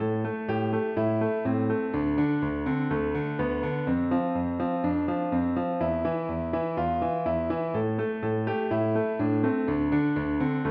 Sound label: Al Hanissim with octaves